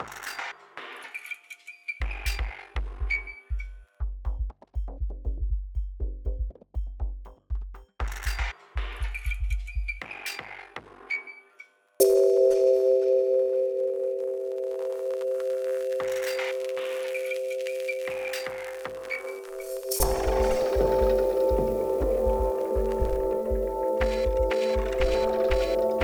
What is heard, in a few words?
subdued ambient noise experiments; weeklybeats 2024